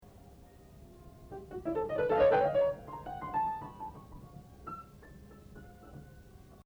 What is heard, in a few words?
Piano